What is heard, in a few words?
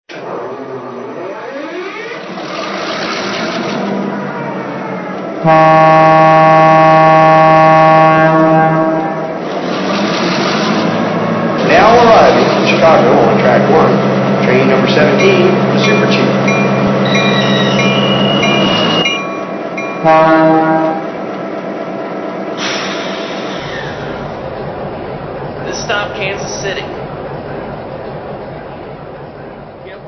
MP15DC